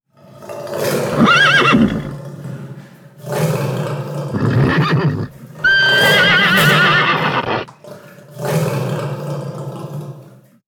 duo lion cheval; loto sonore; loto des animaux

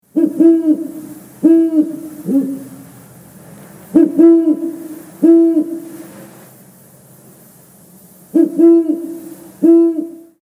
hibou; loto sonore; pour les petits; loto des animaux

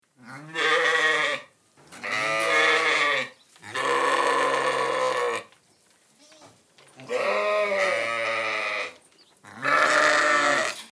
moutons; loto sonore; pour les petits; loto des animaux

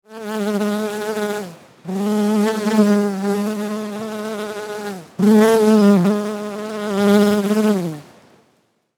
abeille; loto sonore; pour les petits; loto des animaux

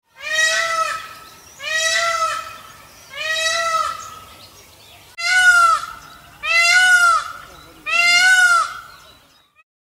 paon; loto sonore; pour les petits; loto des animaux

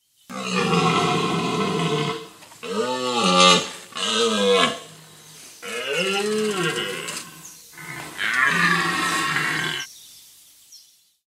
cerf; loto sonore; pour les petits; loto des animaux

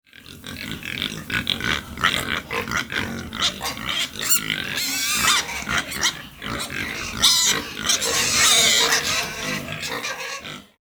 cochon; loto sonore; pour les petits; loto des animaux

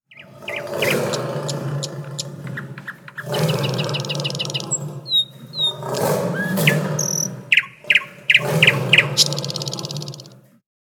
duo lion rossignol; loto sonore; loto des animaux

